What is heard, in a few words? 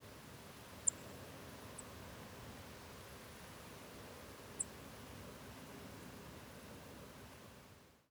s shaped